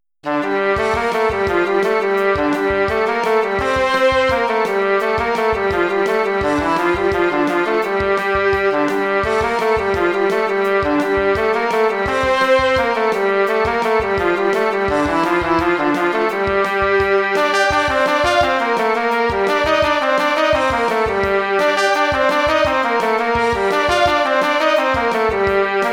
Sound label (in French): Cercle circassien